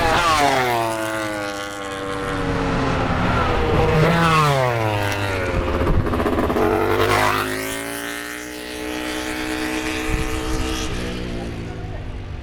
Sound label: Racing SE